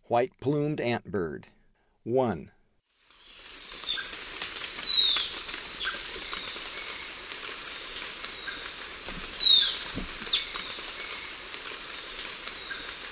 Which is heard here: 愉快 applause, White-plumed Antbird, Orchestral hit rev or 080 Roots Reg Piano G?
White-plumed Antbird